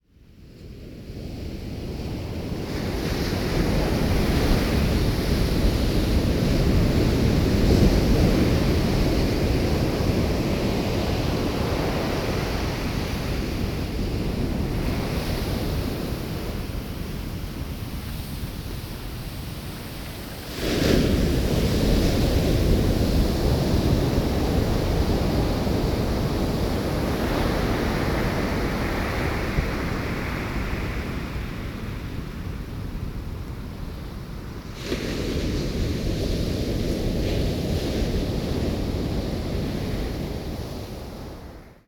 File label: Vatomandry Beach